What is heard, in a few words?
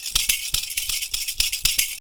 PERCU; Univers Sons; Raricussions